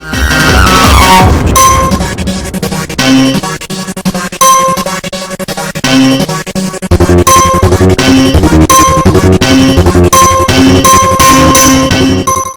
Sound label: sparta remix sped up earrape